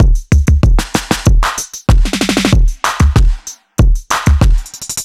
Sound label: Electro Drums 95; musicradar